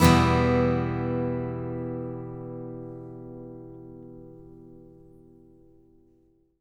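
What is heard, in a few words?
Acoustic guitar E minor up, Country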